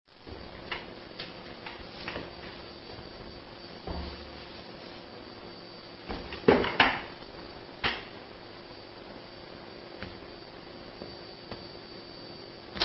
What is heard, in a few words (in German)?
furz